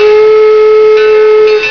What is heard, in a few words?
whistle; xmas